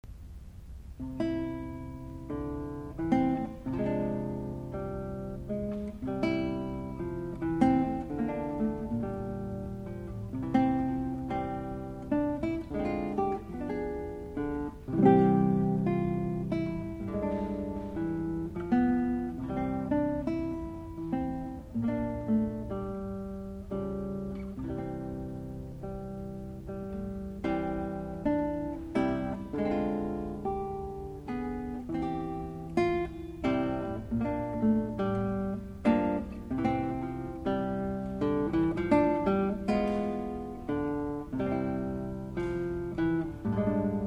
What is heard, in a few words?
Sad Song, classical guitar